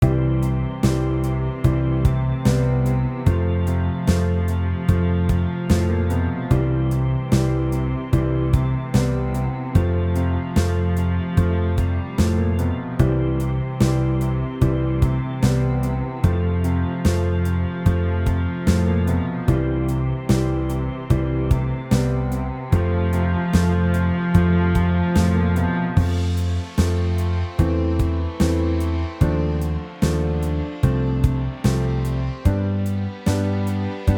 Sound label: Instrumental Arrangement